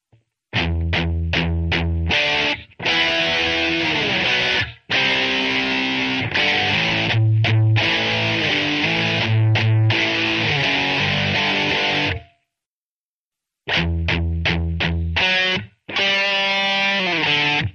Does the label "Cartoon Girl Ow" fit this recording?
No